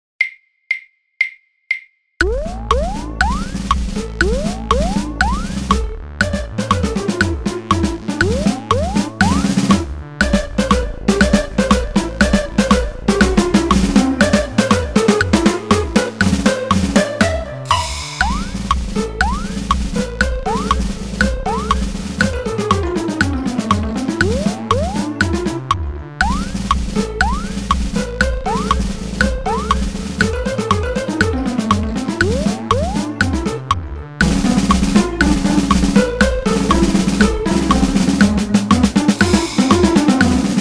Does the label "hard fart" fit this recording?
No